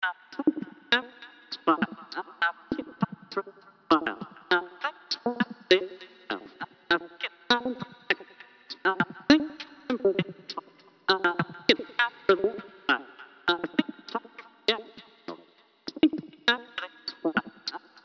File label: Vocal Sequence; Beds